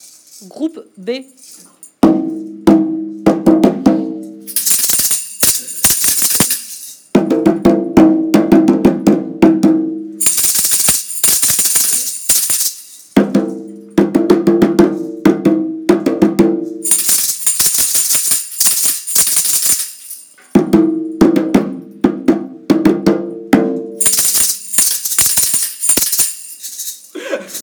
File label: batucada